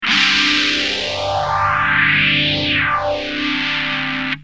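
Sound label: guitar tokai tph2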